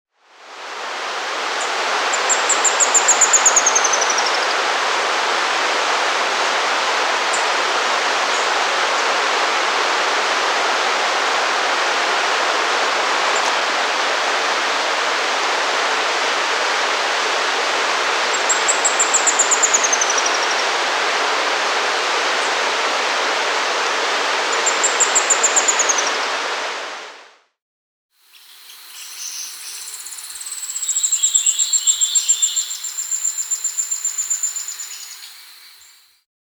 Ash-Browed Spinetail (Cranioleuca Cyrtata); S. America; Andean Birds; Natures Songs

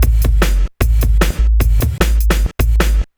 Drum, Breakbeat

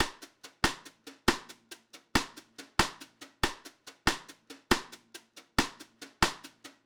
Drums, Jazz

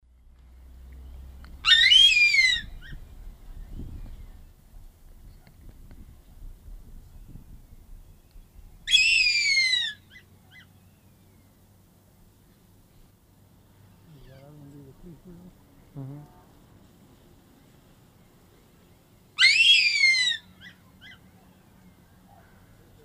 Harpia harpyja, calls of pair; S. America; Eastern Lowlands

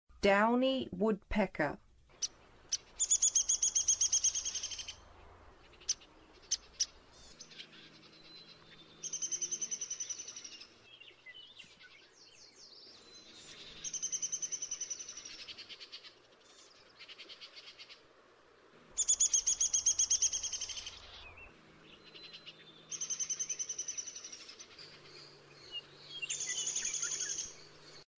Downy Woodpecker, Songs of Birds of North America; Western USA; Western USA Songbirds

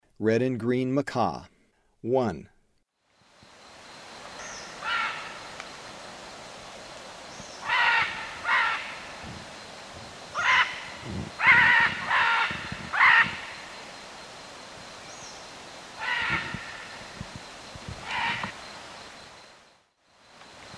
Red-and-green Macaw; S. America; Eastern Lowlands

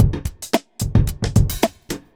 evil beats

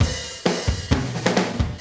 Drums, Rock

